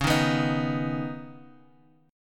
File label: Db 7th Suspended 2nd; 9,6,9,8,x,x; Db7sus2 strummed